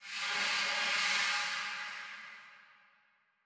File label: Dis Pollen